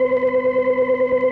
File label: ORCHESTRON C3; Sonic Reality; Vintage Timetraveler